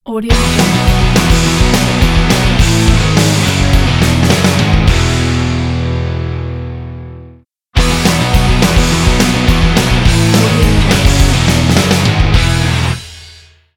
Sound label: happy logo